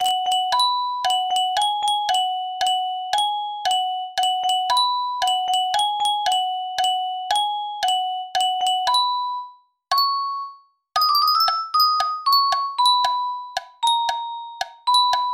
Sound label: music box; fnaf 2